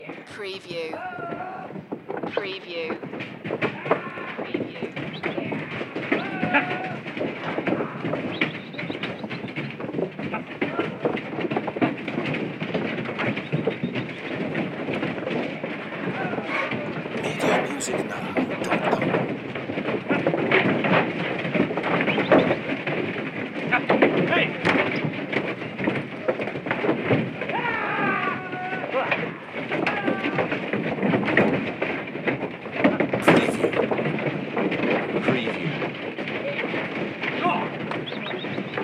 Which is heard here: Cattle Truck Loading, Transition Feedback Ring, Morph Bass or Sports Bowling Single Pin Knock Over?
Cattle Truck Loading